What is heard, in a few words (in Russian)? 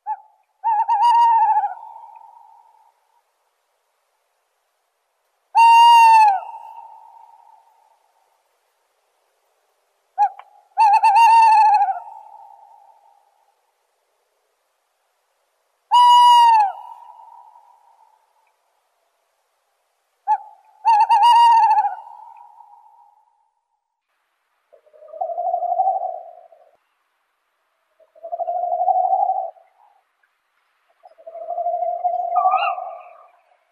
nejasyt seraja kriki